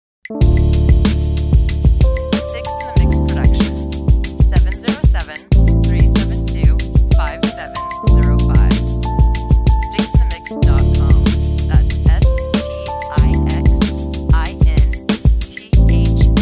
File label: piano